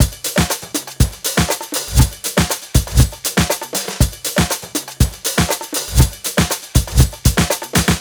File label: 120 beat busy, Nu Jazz House; PowerFX